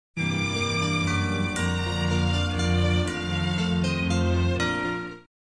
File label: Silent Night, Brass Band and Small Group